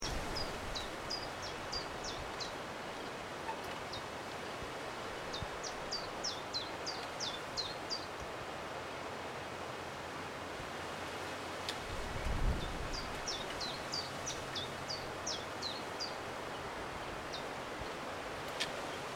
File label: ChiffChaff song type2